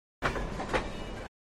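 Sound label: Single Clack Train Roll, track click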